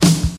classic clean steel snare drum f key, snare sound tuned to the F note